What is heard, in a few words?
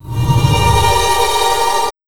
HRD 130 SYN, Big Beat; USB Soundscan vol.25; AKAI; HARD 130